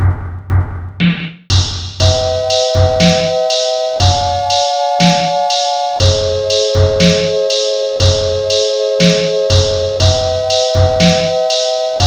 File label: Sinewave